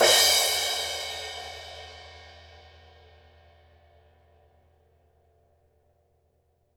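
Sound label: cymbals room; Q Up Arts; Denny Jaeger Private Collection; CMB KIT 1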